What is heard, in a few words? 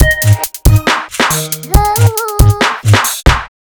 Grime Kit Barz